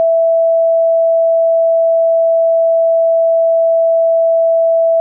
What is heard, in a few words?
sine wave